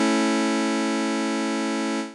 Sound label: Major triad ji saw